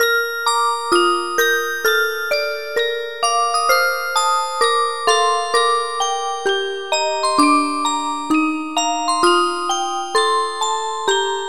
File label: saezuri musicbox